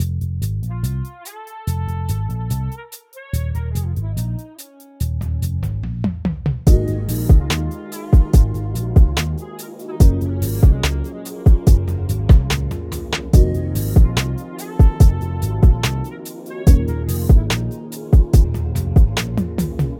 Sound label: 144bpm A min